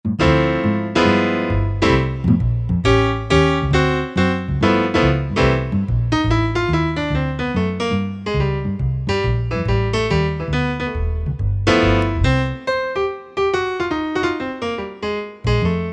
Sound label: Ac Bs Piano